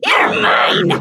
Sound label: Hit